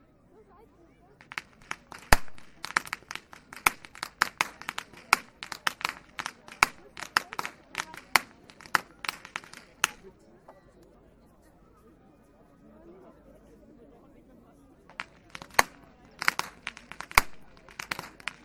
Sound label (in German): schnalzen, jugend